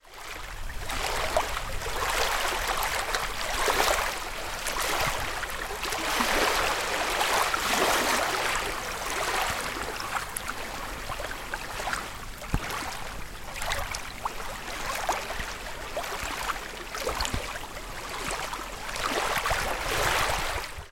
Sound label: Waves On The Shore Road